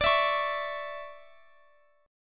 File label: D Major 7th Suspended 2nd Guitar Open F, chord; strummed; x,x,x,21,19,20